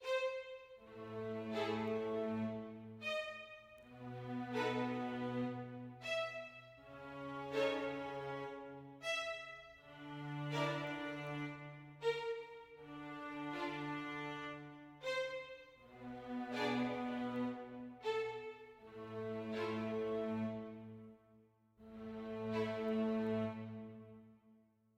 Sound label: dark med